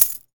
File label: Pickup Gold